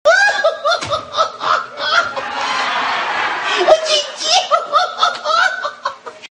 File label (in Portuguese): risada; zacarias